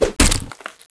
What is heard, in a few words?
Blow